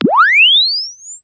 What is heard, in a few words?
Press Two Sides Chirp; Samsung 4 Recordings